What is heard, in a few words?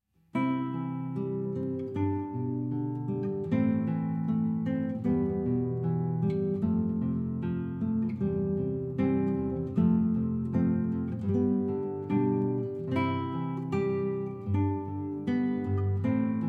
canon; weddings